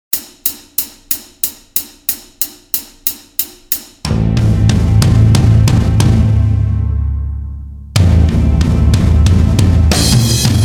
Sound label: backing Bass Drums